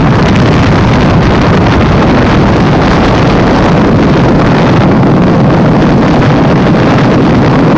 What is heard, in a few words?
afterburner loop; VM Viper